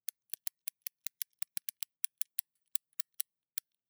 small gear cogs

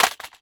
plastic drop, plastic cup drop

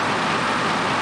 rolling grass dry, slow

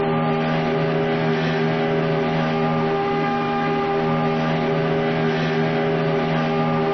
engine medium rpm, motorbike

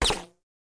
bullet fly by

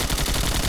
M4 fire

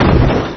car hits obj

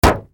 car hood impact

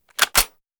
rifle reload

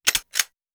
handgun fast reload

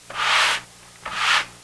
floor sweep

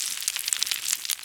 rocks falling

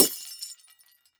bottle smash, glass bottle smash

